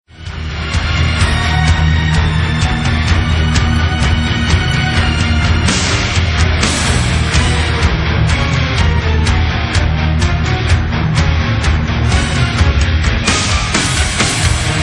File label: hard rock intro